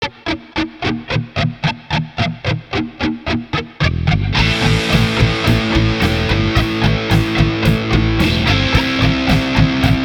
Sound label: Flanger